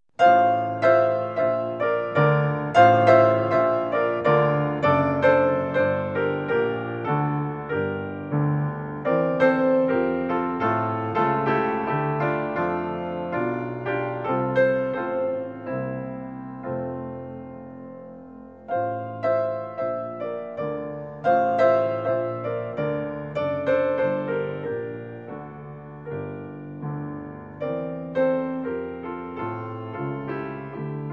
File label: Waltz in F major; SHUBERT; Op127